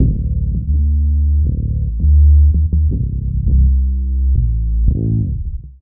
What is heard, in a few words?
Lo bass; Sonic Foundry (Sony Creative Software); Knutrix Drum & Bass